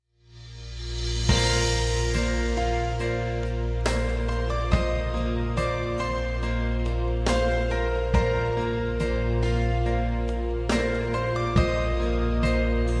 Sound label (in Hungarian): Pontos Ido Alapzene; Szignal alapzenek; SZEKELYUDVARHELY